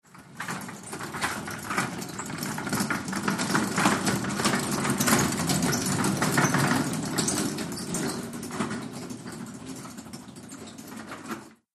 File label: Gurney Cart Pass Slow; gurneys